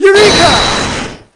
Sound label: eureka